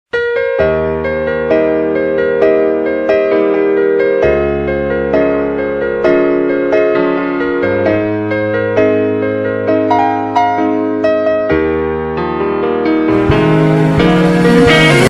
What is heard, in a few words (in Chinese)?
piano短信铃声